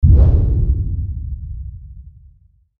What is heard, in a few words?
element fire; maoudamashii